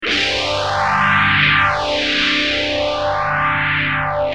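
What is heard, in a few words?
guitar tokai tph2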